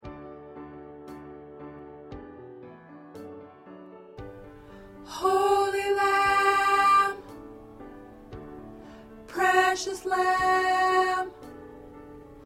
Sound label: Alto